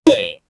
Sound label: man face hit vocalisation, violence